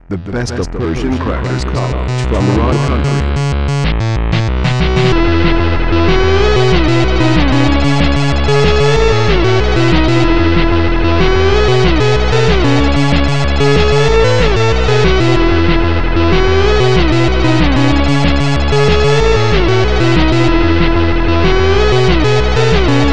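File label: keygen song.xm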